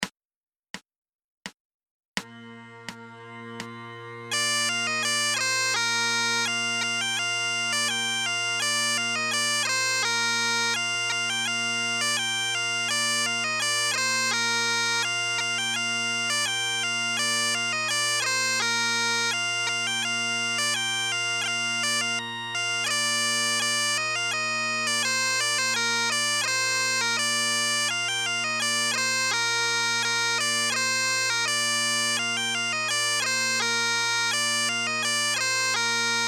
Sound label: hanter dro accomp